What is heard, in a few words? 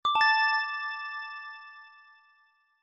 notification